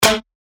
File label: PERC A ClubKilla, percussion; revealed percussion vol 3